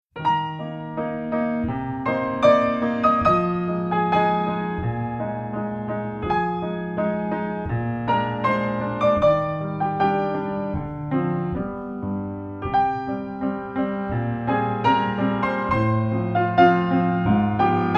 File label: piano; Bruxelles